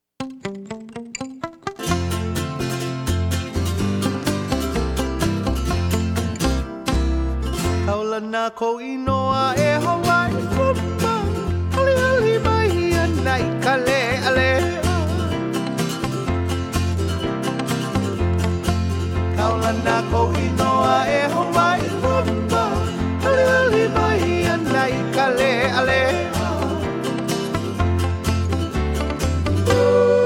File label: Hawaiian Rumba